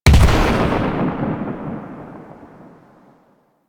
Ship Primary Cannon Proj Explosion; Weapons; Warframe; Railjack

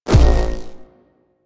Hoverboard Land; Warframe; Vehicles; Venus; anim sounds

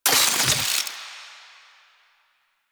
Epitaph Prime Reload A, Weapons Sidearm; Warframe; Tenno; Wraith Sidearm

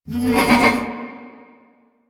Ram Idle Grunt; Duviri; Animals

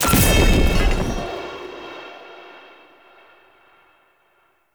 Spear Destroy; Enemy; Lady Deora; Abilities

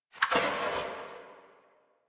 Omicrus Tail; Warframe; Quests; The New War; Weapons

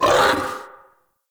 Battle Dog Pain

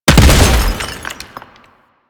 Ammo Explosion; Ammo Ability; DE; Warframe; Frumentarius

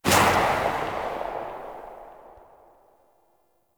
Pistol Tail; Warframe; Tenno; Priest; Weapons

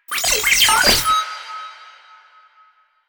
Sniper Drone Vocal; Enemies; Drones; Warframe; Lasrian

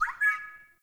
Ext Close Bird, Ambience

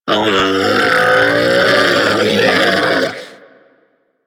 Predator Player Lure Call; Venus; Animal Lure

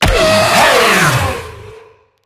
Infested Lich Drillbit Pain

